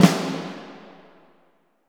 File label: SNR SONAR, Orch Snares; Roland LCDP03 Orchestral Perc